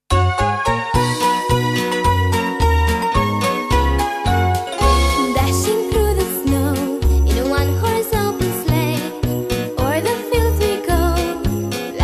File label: Jingle Bells